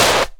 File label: Snare